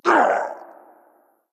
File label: hitreact human male large